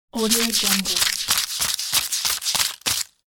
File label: counting money